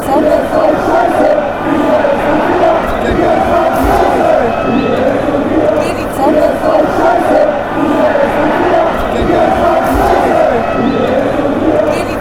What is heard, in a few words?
fan chants; schalke